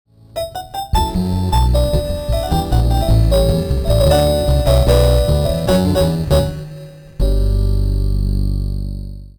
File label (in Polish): dzwiek aliasing